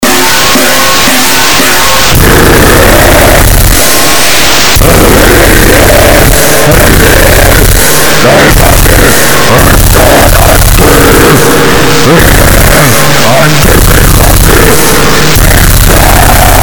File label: Pointless Screaming